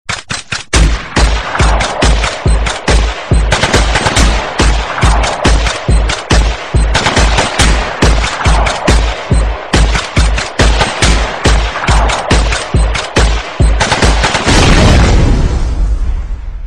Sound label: guns music